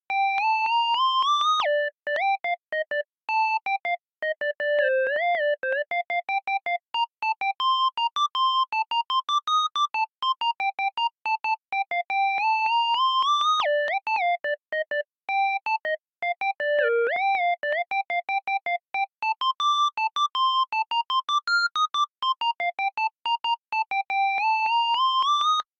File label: Melody; chippy